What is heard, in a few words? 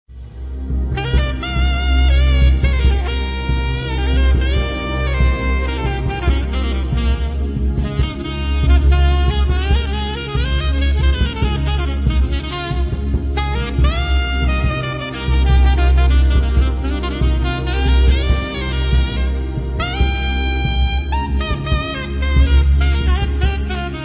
Ethereal Jazz Mood